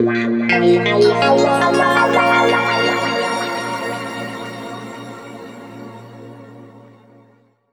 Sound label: A# P-S WA -L, Guitar Samples; East West; Steve Stevens Guitar Samples Collection; PTCH-SHFT+WA